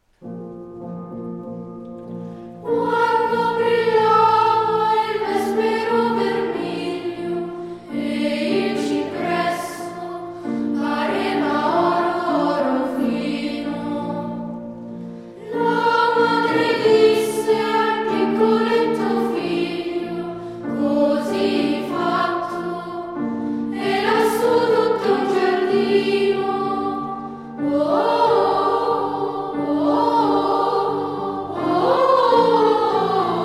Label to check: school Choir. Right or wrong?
Right